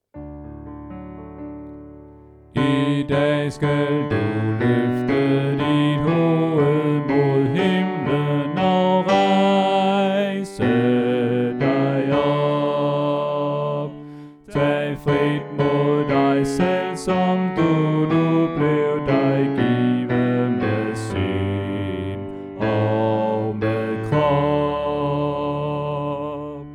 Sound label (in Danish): Bas; oevefiler